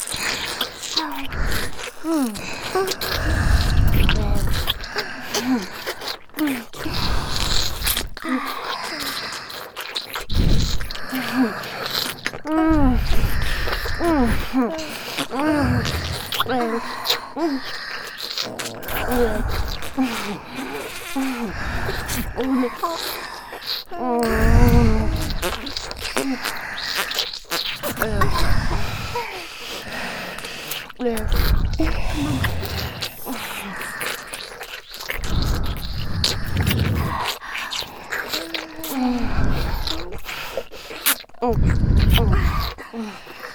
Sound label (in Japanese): 吐息フェラループ